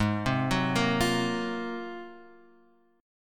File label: Ab 13th, Guitar, Drop D; arpeggiated; JGuitar